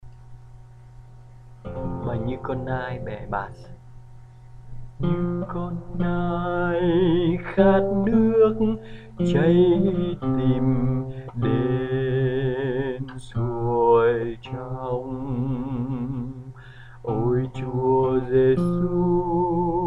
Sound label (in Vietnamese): Basso